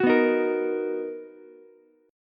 B Major 7th B down Ukulele; strummed; JGuitar

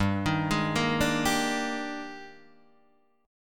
Eb Major 11th G, guitar

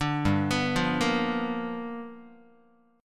G 7th Sharp 9th G chord, Guitar; G7#9 arpeggiated; JGuitar

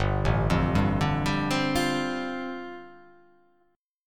G 7th Suspended 2nd Suspended 4th

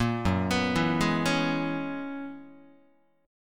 Gb 11th, Guitar; Gb11 arpeggiated; JGuitar

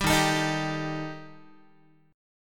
Eb Major Flat 5th Eb Guitar; EbMb5 strummed; JGuitar

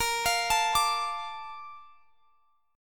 A sharp Major 7th, down; arpeggiated; chordshape

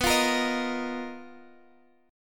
B Major 7th Flat 5th B Guitar Open F; BM7b5; strummed; x,x,11,12,13,10

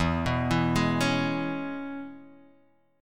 E 6th, down

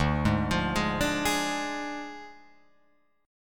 D Diminished D, Guitar, Open F; arpeggiated

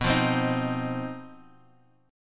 C Minor Major 7th Double Flat 5th B

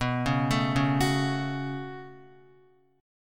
B 7th Suspended 2nd, down, Guitar; B7sus2; arpeggiated; JGuitar

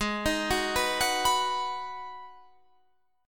B Major G sharp, B/G# chord; arpeggiated; JGuitar

